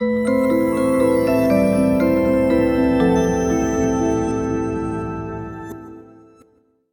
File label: Game Lose